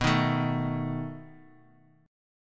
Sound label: B 7th B down; B7 strummed; JGuitar